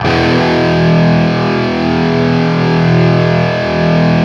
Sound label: 5TH E looped, Guitar; East West; Steve Stevens Guitar Samples Collection